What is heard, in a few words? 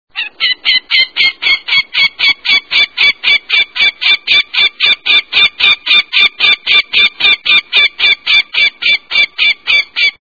Northern Goshawk